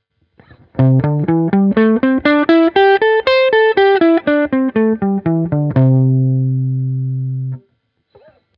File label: blues, guitar